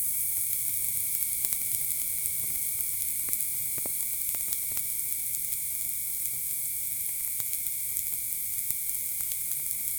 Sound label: ultra realistic close up, glugging